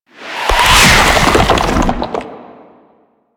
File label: Sand Spawn; Warframe; Man In The Wall; Enemy Gameplay